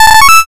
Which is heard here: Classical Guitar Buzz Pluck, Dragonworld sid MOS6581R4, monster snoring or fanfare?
fanfare